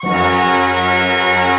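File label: pentatonic C fortissimo, orchestra; Sound On Sound; Peter Siedleczek's Orchestra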